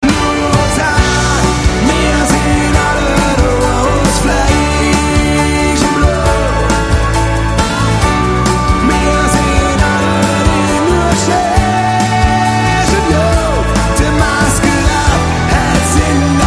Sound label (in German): live mit orchester